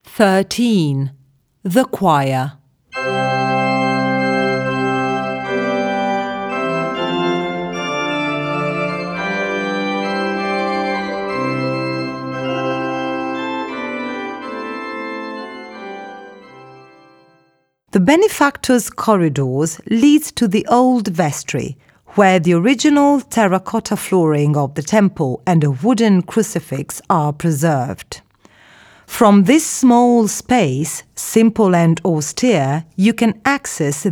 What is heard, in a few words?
Choir